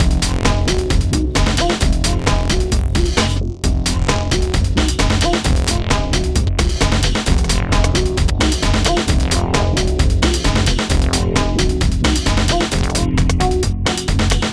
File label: Warned, Hardcore Breakbeat, 132 Fmin; Big Fish Audio; Hardcore Breakbeat Action